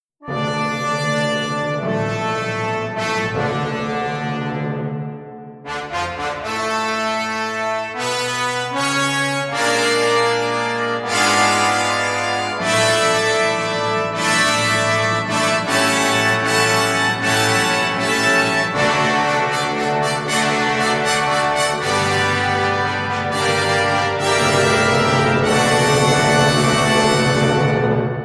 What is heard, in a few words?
Fanfare; Happy-Birthday!-Fanfare-for-Anton-Bruckner; composed by Gerd Schaller